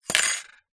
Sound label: glass shard from broken picture frame drop not break; foley